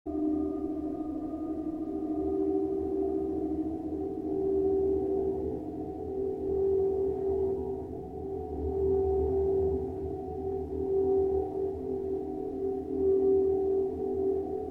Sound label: chimes wind